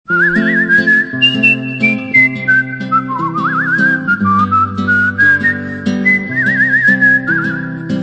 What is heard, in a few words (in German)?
pfeifen